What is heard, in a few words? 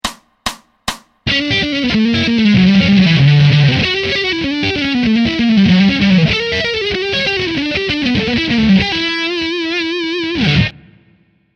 E Dorian Spiral Lick fast